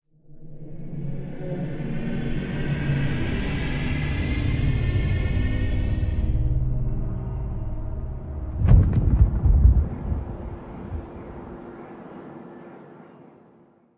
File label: cave, ambient; Minecraft